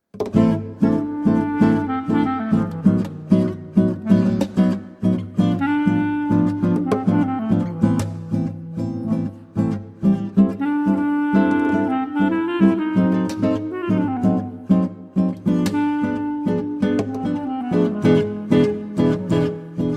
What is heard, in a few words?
Libertango